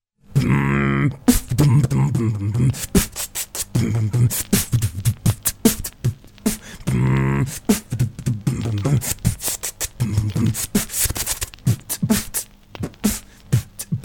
beatbox

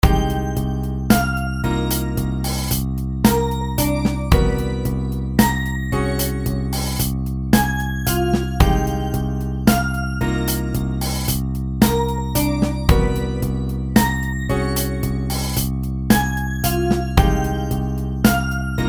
pop rock

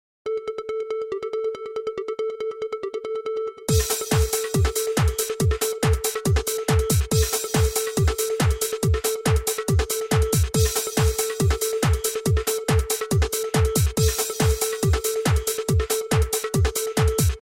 techno

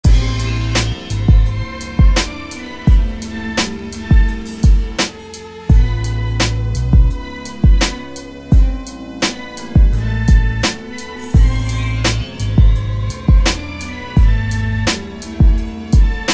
beat

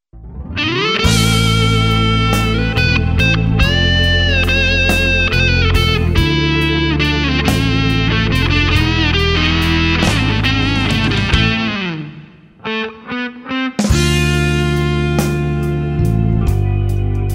blues

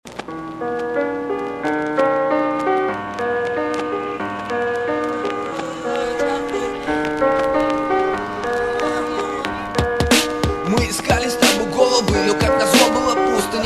rap; rapru fightclub sparring1

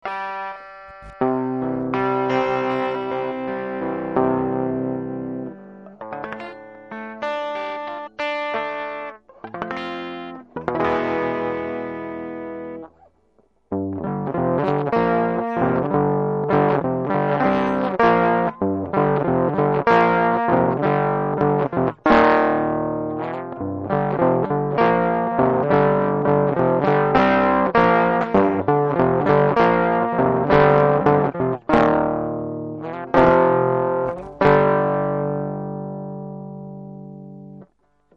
screaming bird overdrive

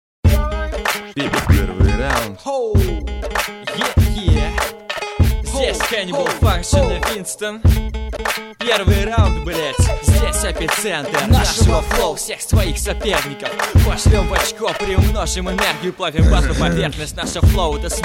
hip hop

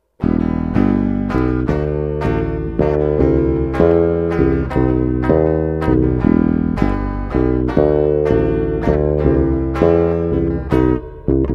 tonica bass

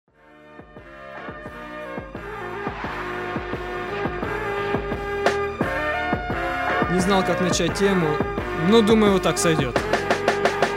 tuning

